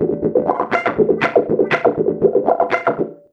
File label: 120 WAH WAH, Electric Guitar Loops